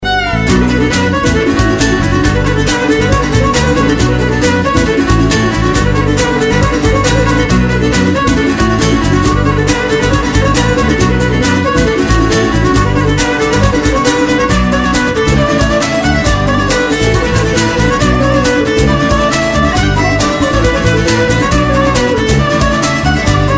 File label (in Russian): irlandskaya folk muzyka veselaya tancevalnaya melodiya